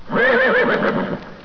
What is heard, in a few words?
neigh